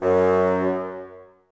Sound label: BNS F#2, Bassoons, Wood Winds; Miroslav Vitous - Woodwind Brass Ensemble; Bassoons Links